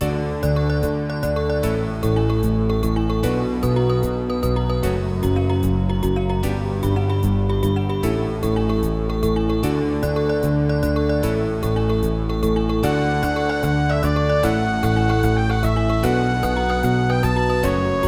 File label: GUS PnP; Lemmings Level 1